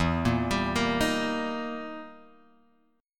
E Minor Major 7th Flat 5th, E, down, Guitar, Open G